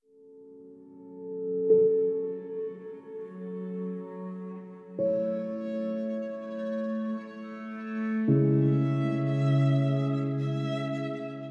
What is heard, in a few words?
Sad dramatic piano and violins; urbanniAudio